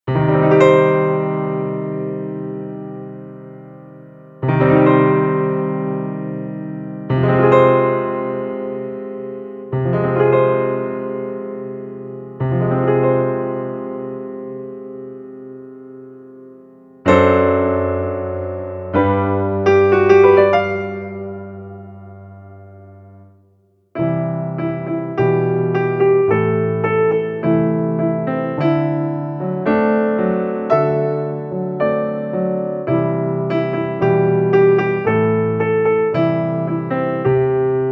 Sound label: Musa mía solo piano